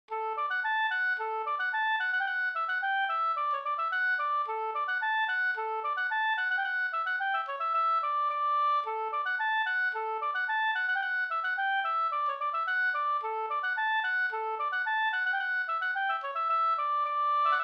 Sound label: polka